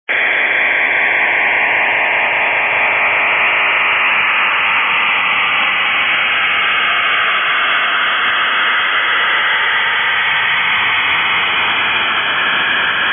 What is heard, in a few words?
modem 160m lsb